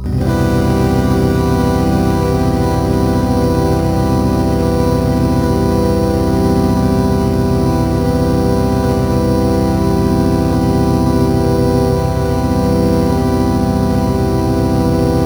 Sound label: intro music; chroma solstice